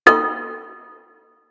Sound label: Kid Glass Tap; Ambience; Props; Void Kid; Warframe; The New War; Zariman; Quests